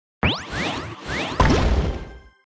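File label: attack; hero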